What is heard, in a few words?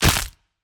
Player Attack Hit Blood; Pulling No Punches